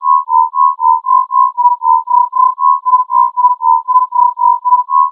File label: Throb4X, modes